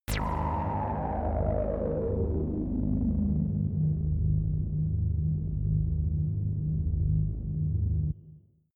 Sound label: transmission mysterious universe, drones